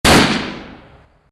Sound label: Boltgun - Single Shot; Warhammer 40000; Tabletop; munitorum Volume I Sound Effects